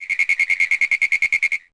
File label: animal; DAoC; Toa